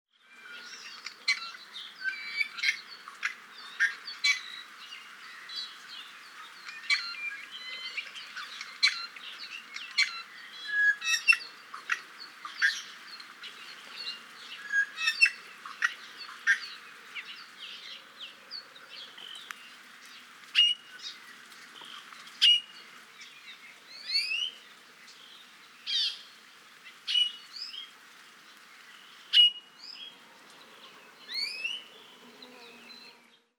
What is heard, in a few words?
maui iiwi